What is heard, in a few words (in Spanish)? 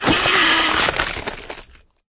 caida